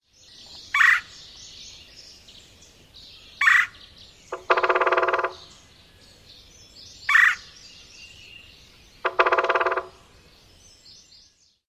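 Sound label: querr calls and drums, Red-bellied Woodpecker; KY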